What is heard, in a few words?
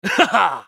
spell cast effort; Vladimir; Character Voices - English